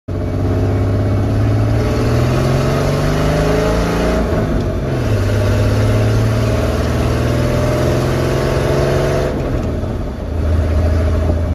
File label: ford 300 turbo swap